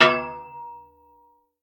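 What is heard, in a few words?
Impact Lantern; SFX; Impact Lantaarn; Smashy Road Wanted 2 android ios switch gamerip 2020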